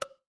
cartoon bonk

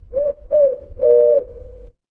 animal owl

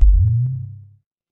ui alert

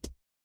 impact thud

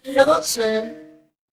feedback success

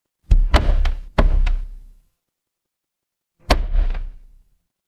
office stapler

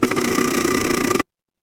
mechanical engine

